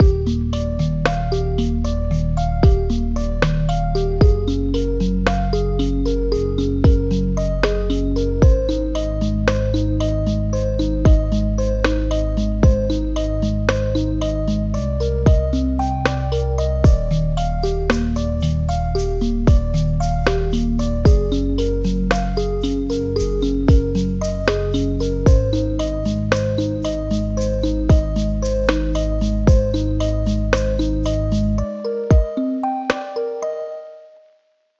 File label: Lo-Fi